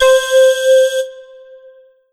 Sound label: Roland PN-D10-01 Calliope Live C5